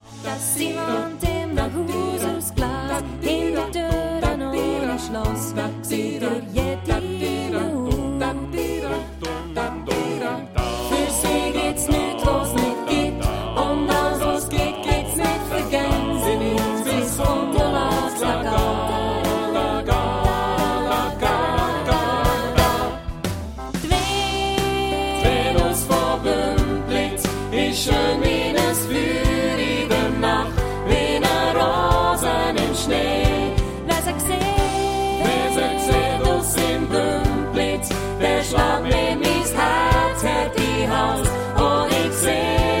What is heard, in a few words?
SATB Solo